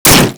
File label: Hammer Weapon Catch; Warframe; Grineer; Hammer Boss